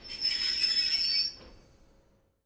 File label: Train Stop